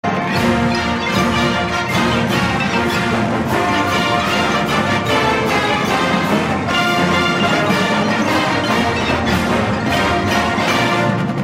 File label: FIGHT SONG; MSU